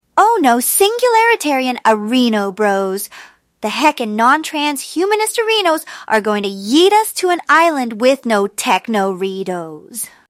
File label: techno